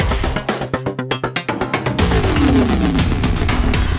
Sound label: Taunt; Skyway